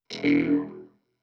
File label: Neo-Wave Vocoder; Pro Tools Essential Demos; Digidesign; Essential Loop Demos; Demo Session - Pop Rock